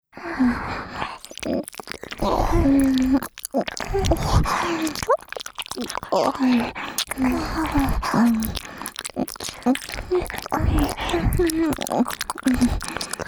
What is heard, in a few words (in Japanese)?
耳奥W舐め3P, ASMR